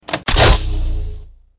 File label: Bolt Reload; Crysis